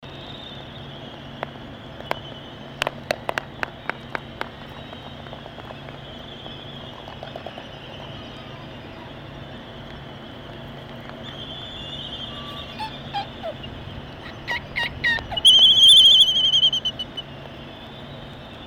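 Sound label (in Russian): temnospinnyy albatros voice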